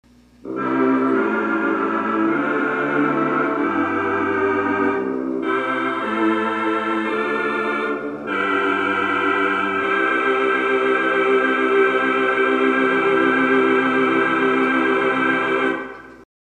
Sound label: Vox